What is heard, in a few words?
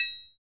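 small triangle; Northstar - Drumscapes Roland; Bonus Perc; Triangles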